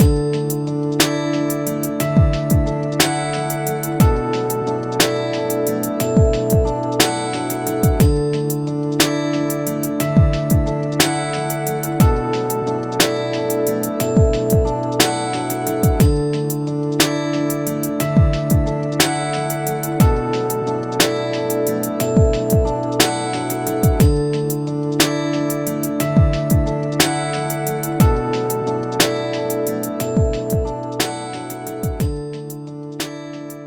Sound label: beat